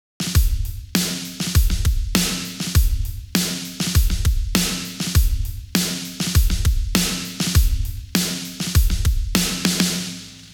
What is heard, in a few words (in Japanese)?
8ビートゴーストノート